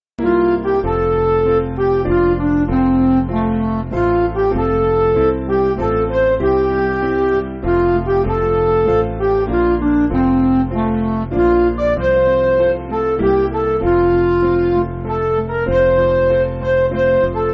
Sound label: Midi, Music Backing